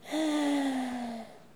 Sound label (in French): ah soulagement